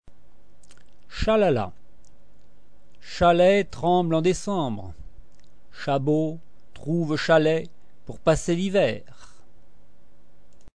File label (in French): moto, motos